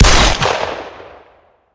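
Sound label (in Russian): пристрелка оптического прицела у винтовки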